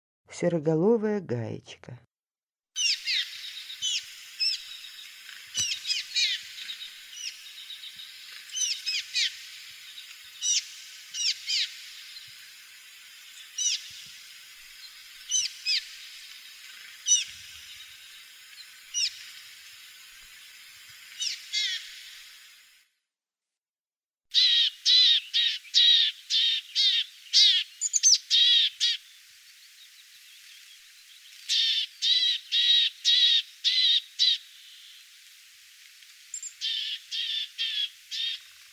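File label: serogolovaya gaichka golos, grey-headed chickadee voice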